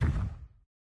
thump; Project Zomboid

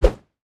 fists fire; Garrys Mod

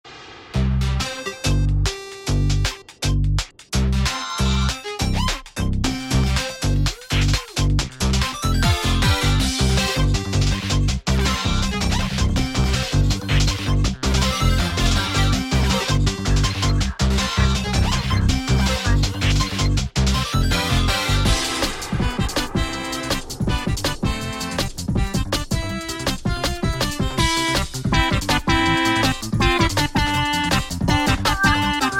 Frenetic - Derpy Techno Birds!

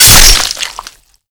stab in; killmove; Garrys Mod

